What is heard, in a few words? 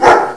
woof